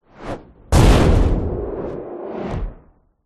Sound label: whoosh approach hit metal hard whoosh tail; sound design